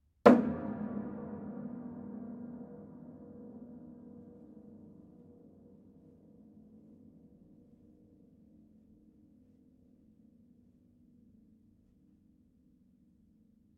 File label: Piano Hits Side